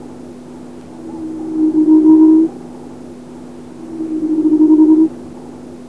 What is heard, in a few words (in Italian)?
Quaglia tridattila, uccelli